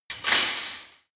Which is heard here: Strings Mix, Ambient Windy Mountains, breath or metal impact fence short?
metal impact fence short